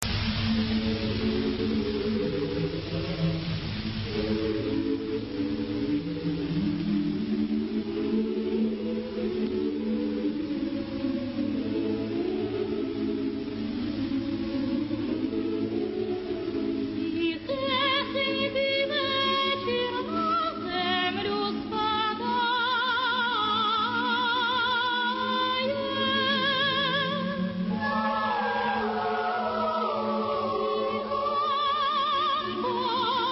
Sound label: ukrainian art song; Kyrylo Stetsenko; Volodymyr Samiylenko